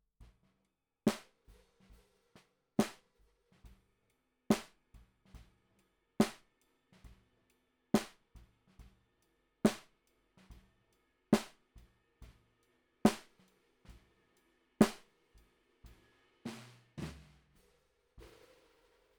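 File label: Snare Bottom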